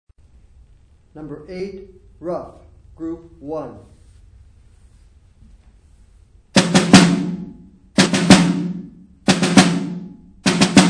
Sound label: snare rudiment